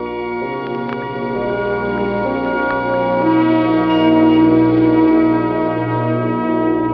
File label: strings, Sad